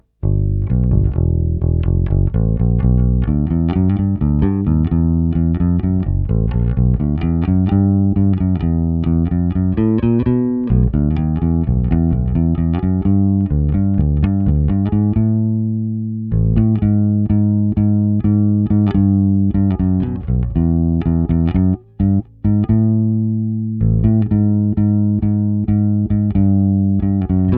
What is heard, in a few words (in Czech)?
Jay Turser Precision bass, Struny Fender 9050 ML, tónová clona otevřená; JTB-400C-BK-A-U; snímačem Fender Pure Vintage '58; Struny Fender 9050 ML, 50-100, tónová clona otevřená; Nahrávky se snímačem Fender Pure Vintage '58